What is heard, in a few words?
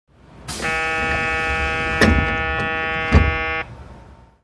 door close